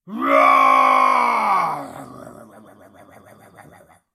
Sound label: Dino roars, Jungleplanet dinolaser battle; SyrinScape; Syrinscape unplugged